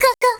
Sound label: combo break; osu!; Luminance - Fluorescence; Shinobu Sounds